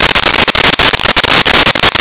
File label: pod coolant spray loop